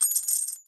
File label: coin